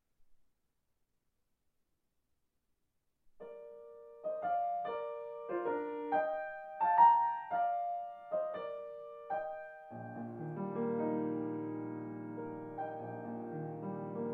B-flat Major, solo piano; 12 Preludes for Solo Piano

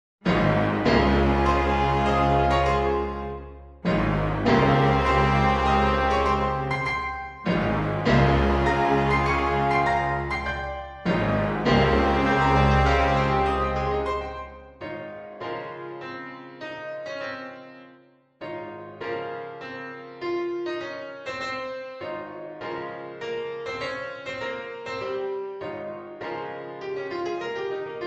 concerto

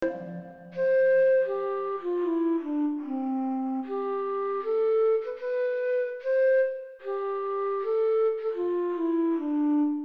Another Flute

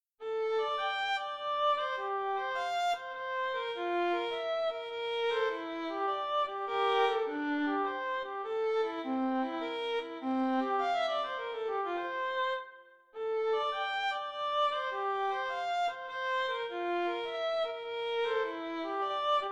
Soliloquy for Violin

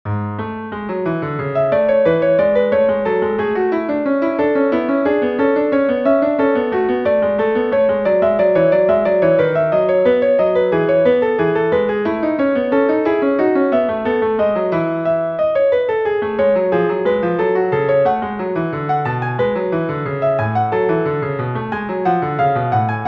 Subterfugue in A major

